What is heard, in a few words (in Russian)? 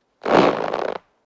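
ras, zvuk rastiagivaniia, stretching sound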